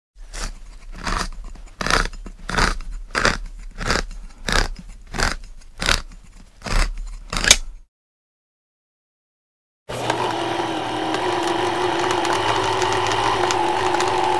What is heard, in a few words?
Can Openers; 100 sound effects